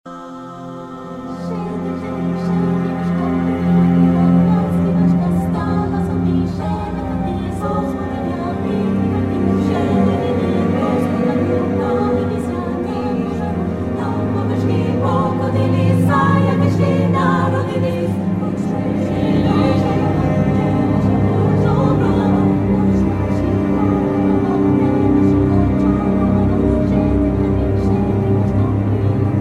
coro con cuerdas

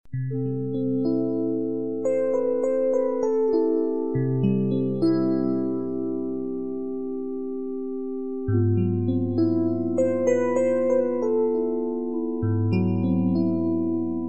Tema de Piano

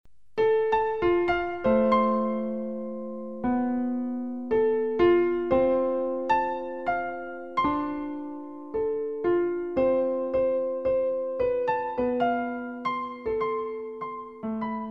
pianito

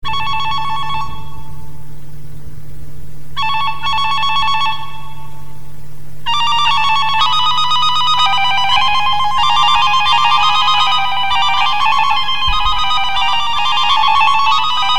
Reggaeton

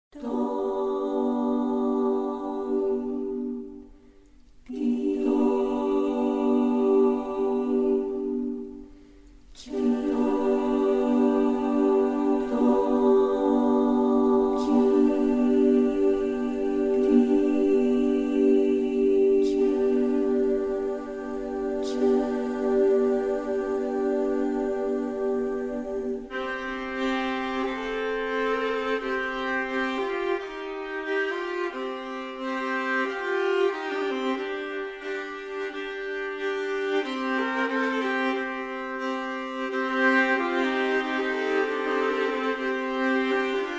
canto con violín

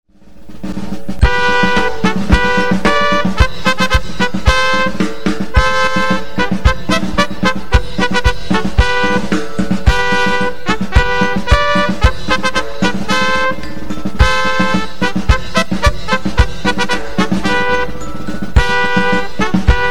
Marcha Habanera

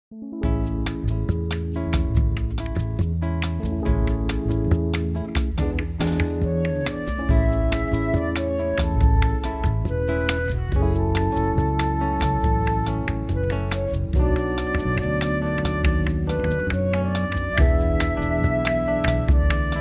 Bossa

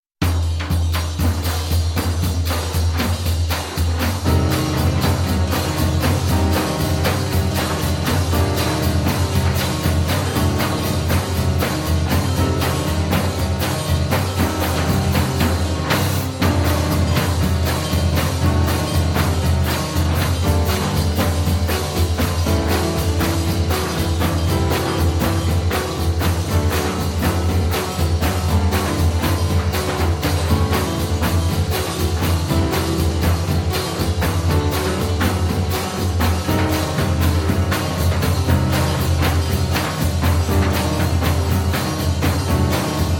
pianos

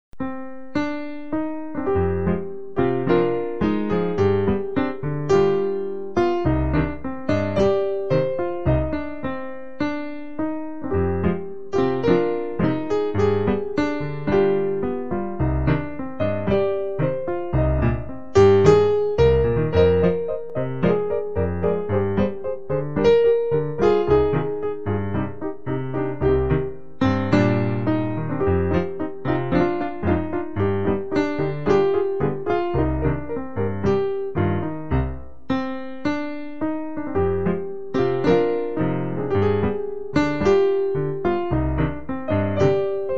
Tango

